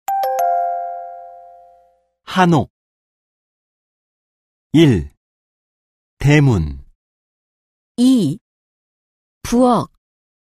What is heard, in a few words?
K-POP